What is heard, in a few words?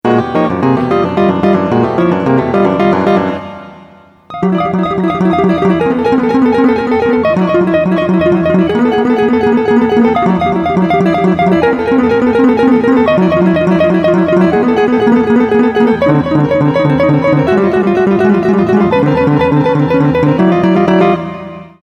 Russian Clusters